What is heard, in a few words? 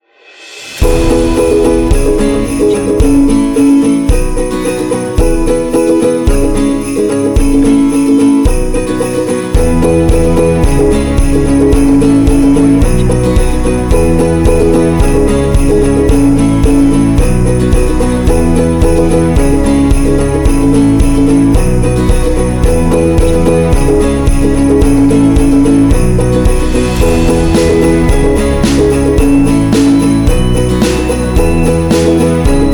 christmas ukulele happy